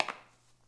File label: lino, ambient; Shadowman